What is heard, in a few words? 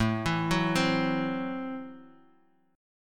A Minor 6th A Guitar Half Step Down